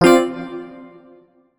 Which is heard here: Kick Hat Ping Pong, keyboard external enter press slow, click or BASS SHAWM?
click